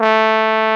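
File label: trumpet, SC55 brass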